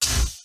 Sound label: fire end, flamethrower; halo; weapons